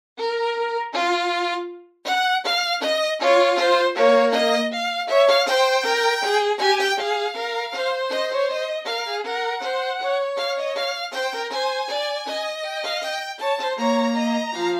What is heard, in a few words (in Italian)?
Fuga Si Bemolle Minore; Trascrizione; Trascrizione Beethoven; Ludwig van Beethoven